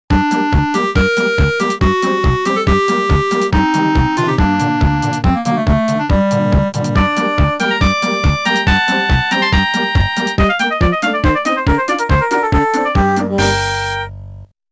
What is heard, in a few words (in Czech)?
melodie